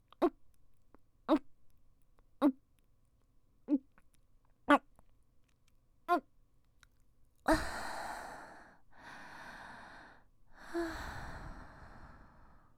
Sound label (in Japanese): ごっくん